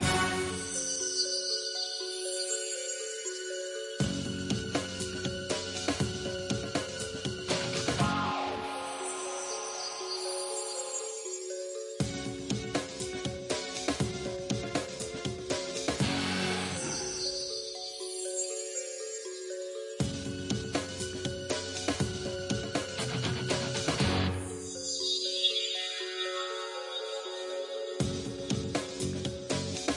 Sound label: tension; bgm